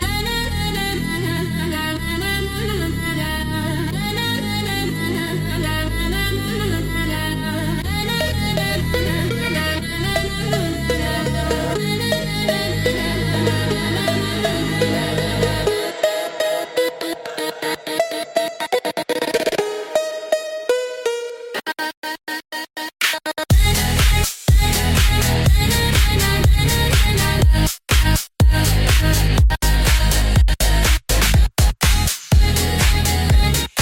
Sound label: phonk